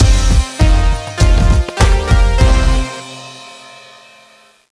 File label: Hiphop Fin; Imagine Modern Dancer; Nintendo DS